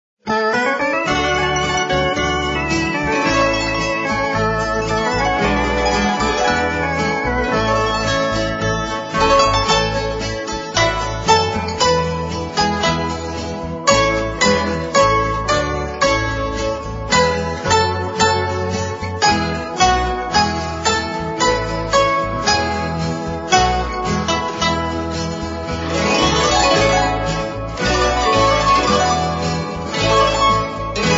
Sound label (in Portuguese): Adeste Fideles Harpa De Natal